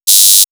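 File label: Shrill Signal, electronic fx; X-Static Goldmine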